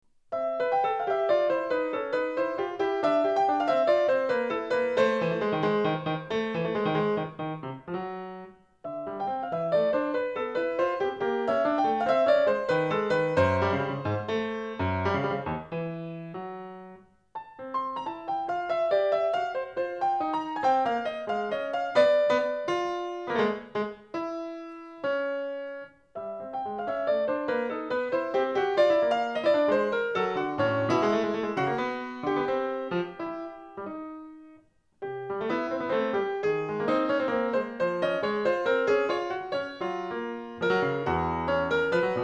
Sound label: valse, piano